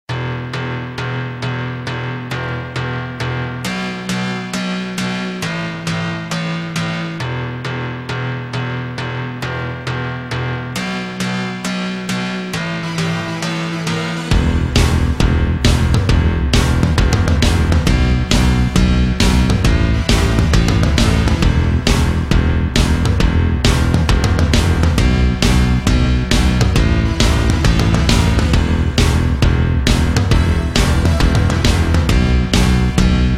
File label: instrumental